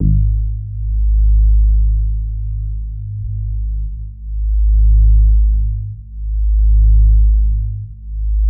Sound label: G#1, synth basses; Music Store Sound Service; Einstein